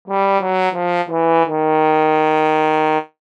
cartoon fail trumpet

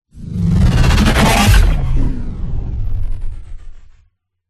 WARPED; PMSFX

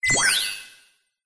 science fiction cyberpunk robot or equipment beep; zapsplat

